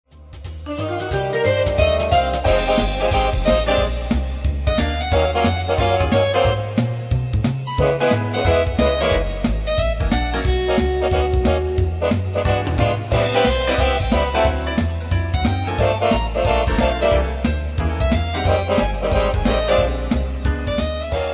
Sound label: fan fare